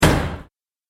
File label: Arcade Game Bang; video game; retro inspired; Video Game Sound Effects; Think Nintendo, Atari, C64; Andrew Fleming